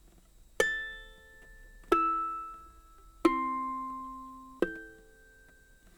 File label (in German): flageolett, ukulele; pono